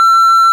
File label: beep